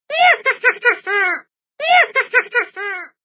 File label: Jester Laugh Fade